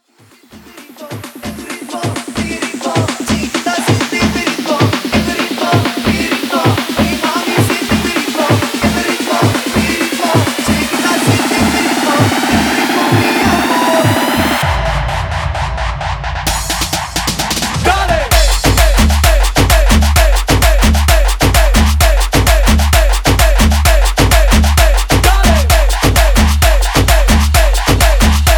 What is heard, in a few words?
mi ritmo guaracha remix 130 bpm